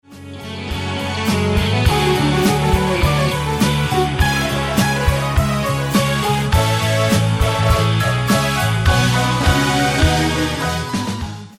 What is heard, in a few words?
lead sample Korg Wavestation